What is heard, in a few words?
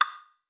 TICK